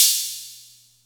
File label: Music Percussion Computer HH open, Drum Machines